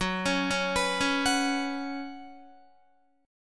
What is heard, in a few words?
F sharp Suspended 4th, F sharp, Guitar; F#sus4 arpeggiated; 13,16,13,16,13,14; 4F,4A,5C,5G,5C,6E; chord shape